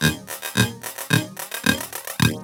LOOP, TECHNO; TECHNO-BOX; ELEC-LOOPS

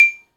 Marimba; E-MU Producer Series Vol. 5 – 3-D Audio Collection; 3DSprints; 3DMarimbaHyb

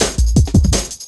Ezee Rolla Cut, Drum Loops, Jungle, 165.5

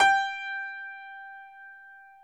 G4 YAMAHA, GRAND; NS GRAND

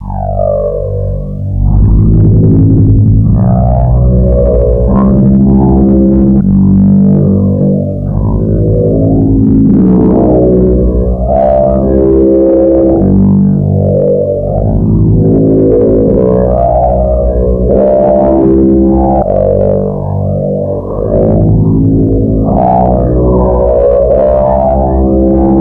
Trombone Effect, effected trombone

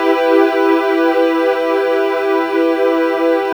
SYN DANCE; Sound & Vision - Gigapack II

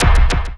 Zappy Kick

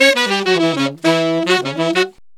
Sax Section in Db, Jazz; Sonic Foundry (Sony Creative Software); Jazz Solos and Sections

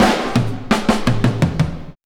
175, fills; Drum&Bass; EZRollers

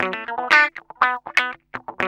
GUI, LICKS; 120 LICKS

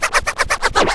SCRA; XP RECORD-FX; Best Service; XXL Production Effects

